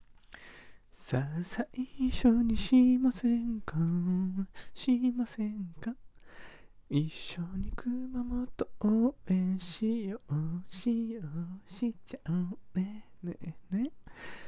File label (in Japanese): しませんか♪熊本ver 民謡風（おねだり）; 馬のオネエさん